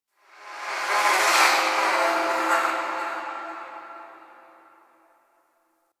Spirit Chatter, Ambience

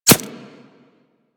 Arrow Impact Flesh; Warframe; Weapons

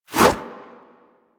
Sentient Brachiolyst Melee Whoosh; Warframe; Sentients

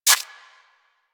Rodent Step Dirt; Warframe

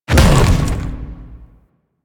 Bowl Ball Impact; Warframe; Warframes; Grendel

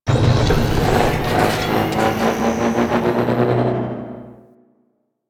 Corpus Ship Gen Start Stop; Ambience; Warframe; Corpus Ship Remaster

